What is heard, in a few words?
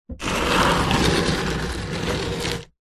foley wooden box large slide gritty concrete